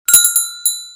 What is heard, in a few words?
Little Bell Alert